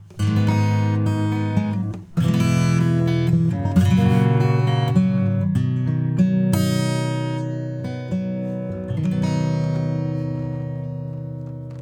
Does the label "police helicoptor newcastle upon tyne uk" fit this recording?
No